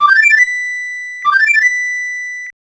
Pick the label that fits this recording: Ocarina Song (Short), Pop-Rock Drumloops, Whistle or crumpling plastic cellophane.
Ocarina Song (Short)